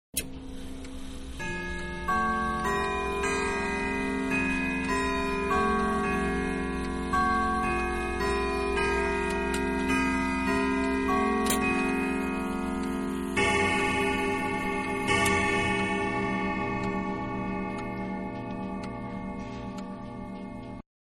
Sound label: Grandfather Clock Striking 2 O'clock; Machines; Manhattan Production Music